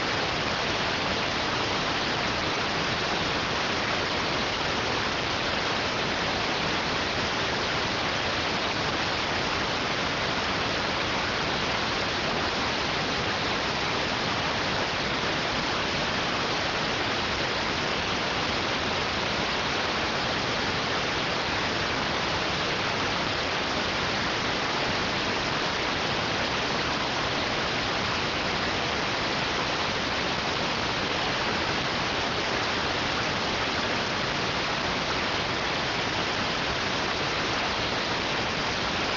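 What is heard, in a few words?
radioteletype receive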